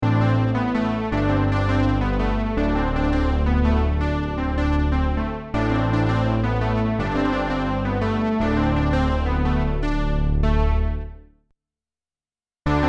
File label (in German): Pulse VCO erst ohne dann mit Effekte